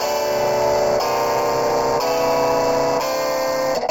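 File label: wet guitar chords, Multiband neural audio effect; Demo without amplifier; Wet-NoAmp; prism distortion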